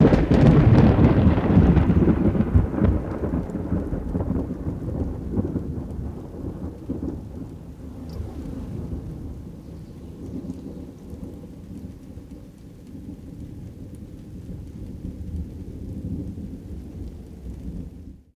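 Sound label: Thunder Crack Heavy, thunder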